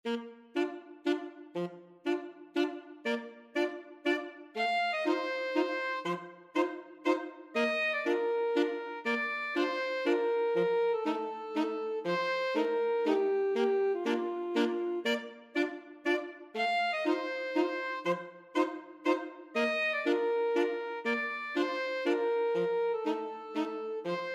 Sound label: alto sax quartet